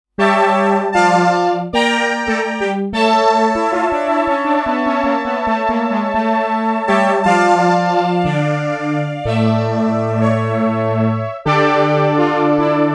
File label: Brass, korg pe2000